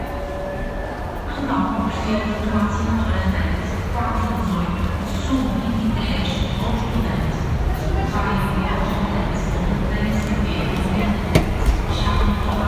Trindade Metro Station, Porto

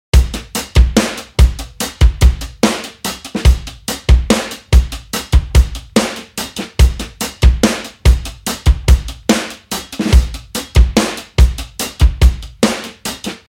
HEAT DRUM LOOP

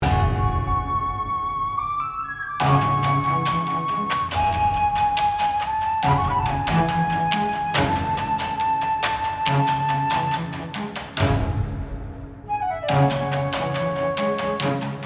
Fast, Solo Flute, Cello, Percussion

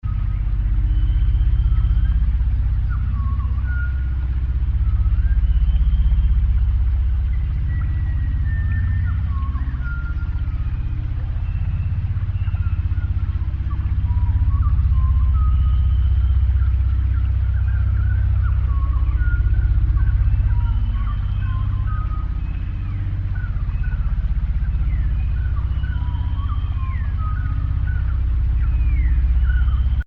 Rainforest 16Hz

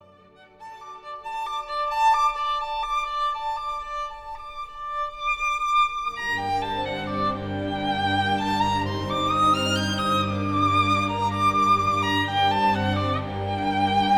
Violin; Beethoven Violin 2; Serebrier

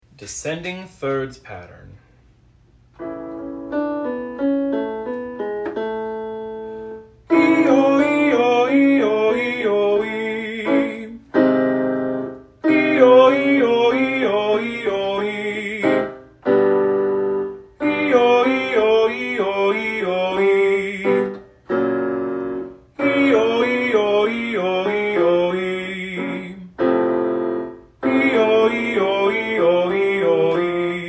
Descending Thirds Pattern; Tenor/Baritone Warm-ups

